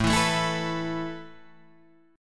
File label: A Suspended 2nd A Guitar Drop D